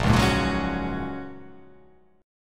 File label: A sharp Minor 7th Sharp 5th, down